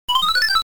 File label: Get a Special Item; Dig Dug II; arcade